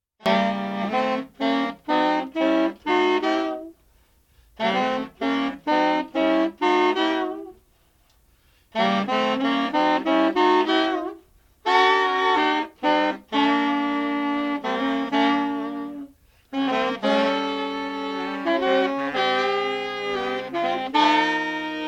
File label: horns ascending